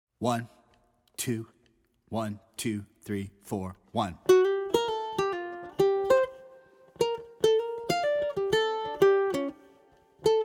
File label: uke